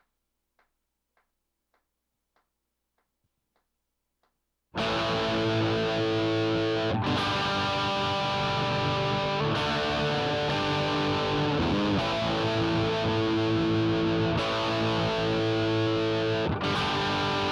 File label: guitar hackery in A; admiralbob77